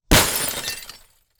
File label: Paver TV, side, CRT TV, concrete paver, drop, impact, obliterate, glass, plastic, metal, shatter, crush; Eiravaein Works; 48Kilos